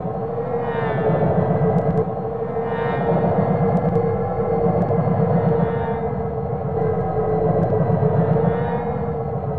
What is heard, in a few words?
loop, techno atmos